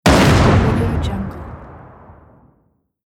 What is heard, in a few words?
snipe rifle shot